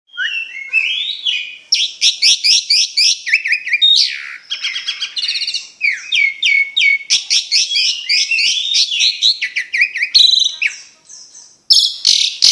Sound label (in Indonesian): Suara Jalak Suren Betina Ngompa